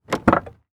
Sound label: Auto Saturn Open Door Exterior; Transportation